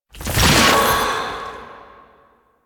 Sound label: Citrine Protective Shell Charge A; Warframe